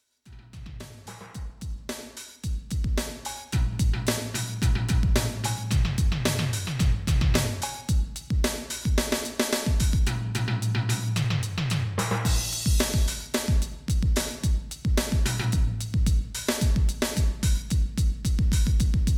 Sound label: drum, tamtam